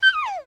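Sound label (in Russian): скуление маленького беззащитного щенка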